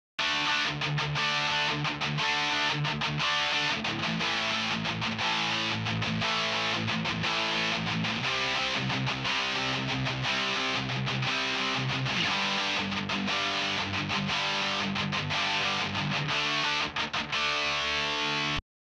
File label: Overdrive Rhythm